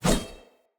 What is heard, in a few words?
Short Sword Whoosh; Weapons; Swords; Sollos